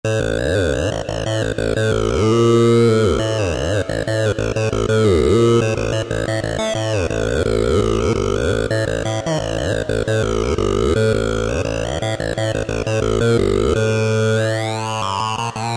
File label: Lead, Roland V-Synth; Factory Patches